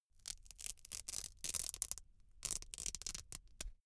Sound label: velcro open cap really slow